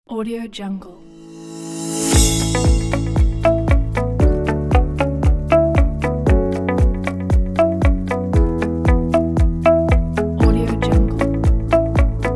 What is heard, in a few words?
happy corporate